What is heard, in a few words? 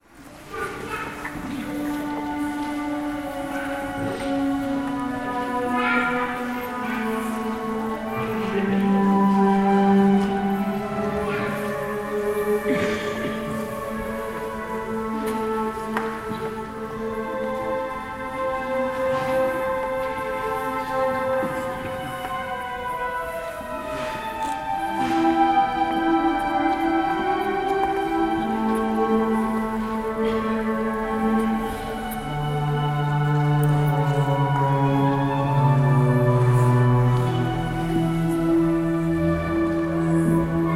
Instrumental; St Jo